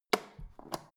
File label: foot switch turn on off soft